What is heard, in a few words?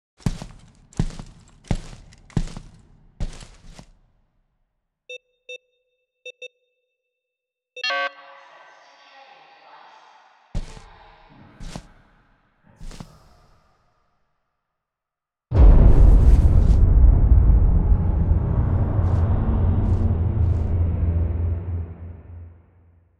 cutscene sfx